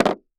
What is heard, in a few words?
Sports Board Skateboard Jump On Cement